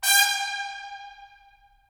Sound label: BRS, Tps Stacc; Roland - LA Composer vol. 4